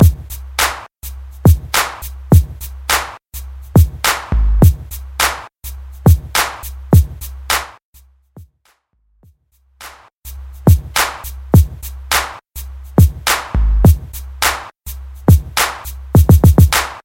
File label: 90s Moombahton